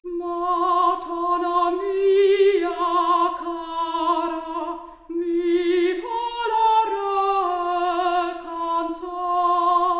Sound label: Soprano Stereo output, 4bit